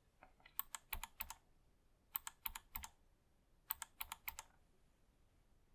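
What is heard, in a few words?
K70 Buttons, keyboard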